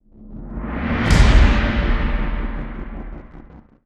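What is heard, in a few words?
stinger distortion synth; transformers war for cybertron gamerip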